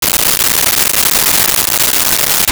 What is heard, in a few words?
shaving cream; Bathroom